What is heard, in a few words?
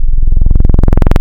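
Saw Rounded, wavetable; Synthetic's Serum Collection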